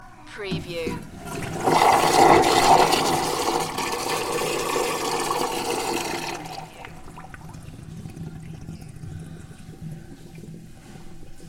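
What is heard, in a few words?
Public Toilet Flush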